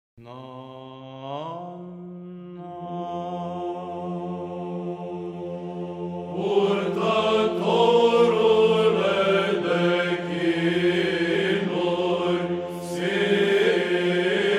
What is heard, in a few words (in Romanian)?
Troparul; Cantari la Praznicul Sf. Mare Mc. Pantelimon; Purtatorule de chinuri